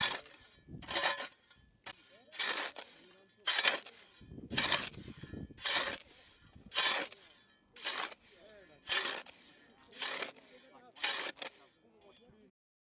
Shovel Digging

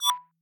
Spacey Smooth Cricket Click

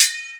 sword

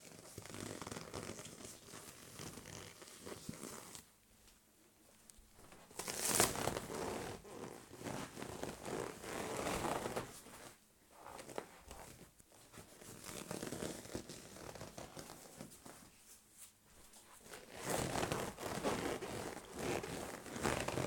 Leather Coach

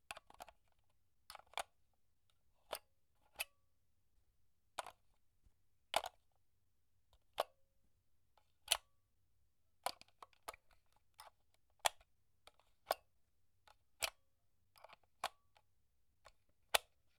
Inserting Batteries Into Machine

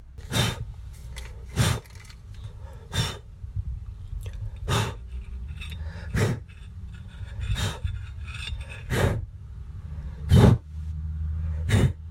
Blowing Candles

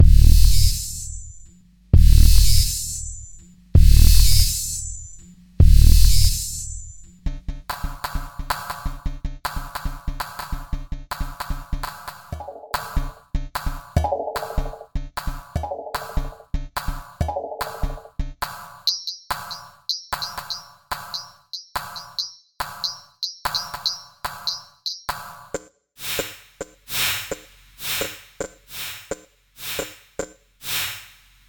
Atari Drums

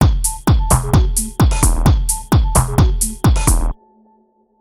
130 tech beat

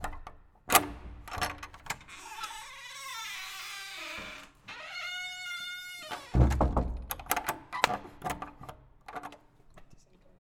Door Unlocking Open Close Locking

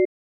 Beep A

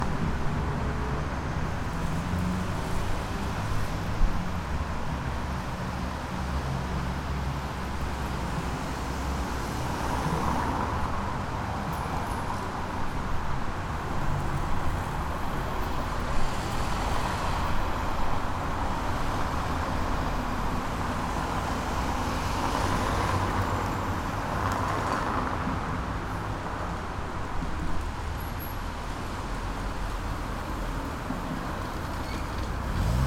Cityscape

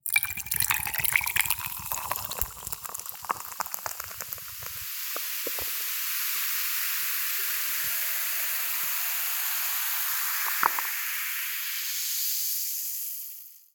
Drink With Ice

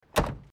Tractor Door Slam

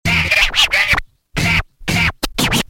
Dj Puzzle Scratch

